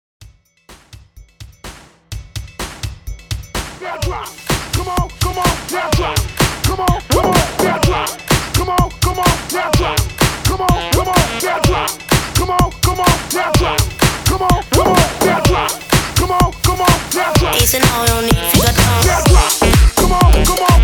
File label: HYPE 126 BPM